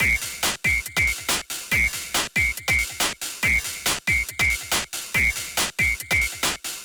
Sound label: distorted loop